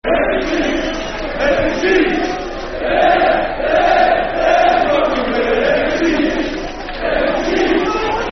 Empty Seats Football Chants (Soccer Songs), Cardiff City; fanchants-com